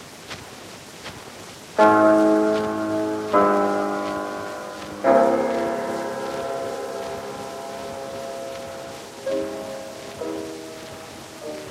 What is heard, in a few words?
dangerous Piano; Overboard!